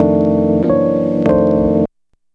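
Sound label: EP riff right; E-MU Formula 4000 Series Vol. 1; Hip Hop Nation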